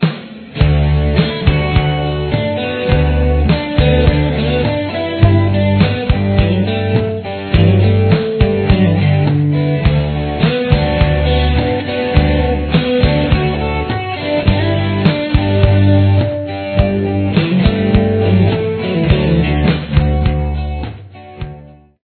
scale improv

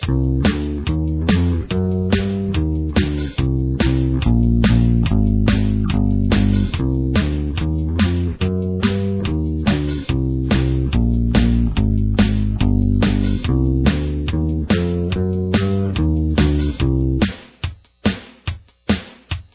bass

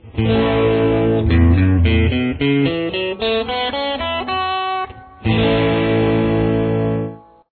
a style open, guitar

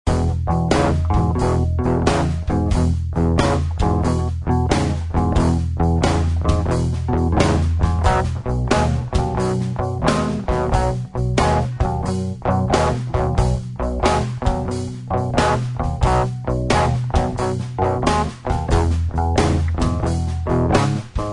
backing

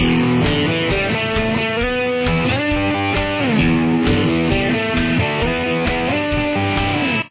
solo

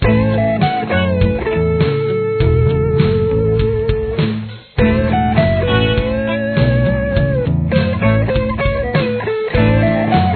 solo

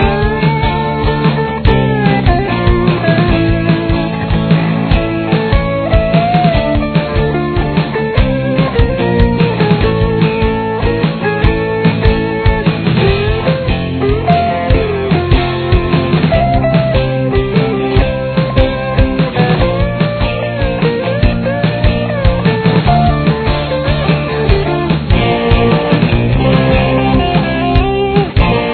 solo mix

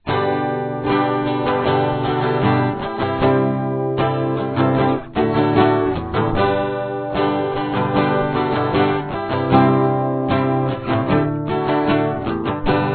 solo

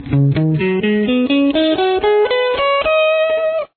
d scale pattern example, guitar

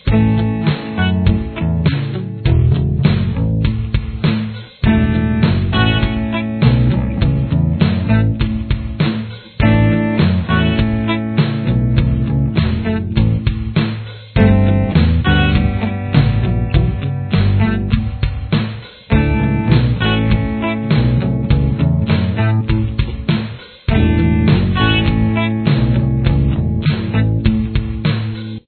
solo backing, guitar

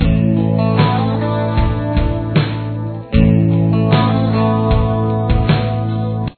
interlude

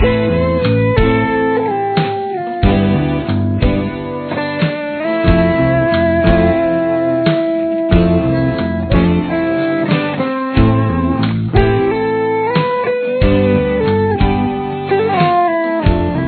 am solo, guitar

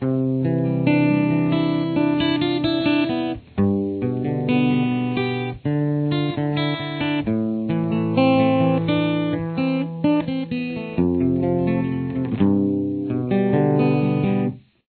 chorus, guitar

